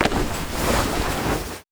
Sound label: Unequip; Test Realm